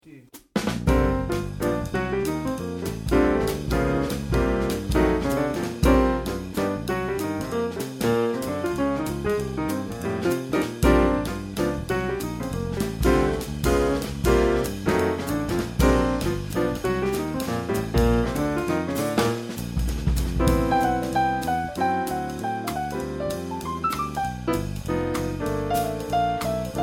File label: Harlans Stomp, jazz drumset